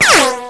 cement, debris

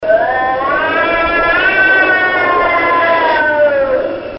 horror; svencoop; FZSaySound

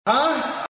huh; svencoop; FZSaySound

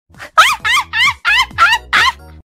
pekolaugh; svencoop; FZSaySound

